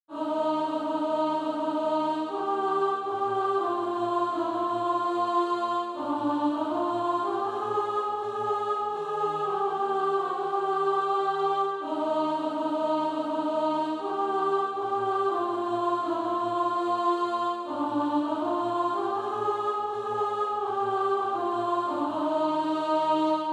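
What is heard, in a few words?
alto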